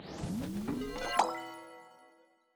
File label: Level Complete